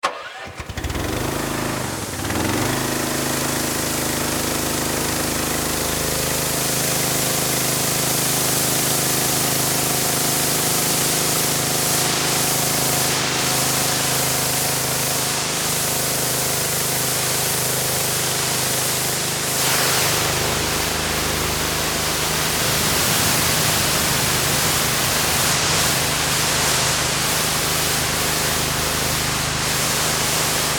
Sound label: Pressure Washer Run, On, Off, Gas, Powered, Landa; Industry, Pressure, Washer; Machinery